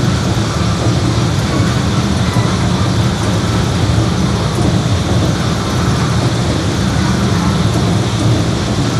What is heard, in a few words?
Shafts Loop